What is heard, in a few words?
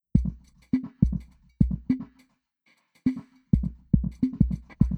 drums impulse 10m centre; Goseck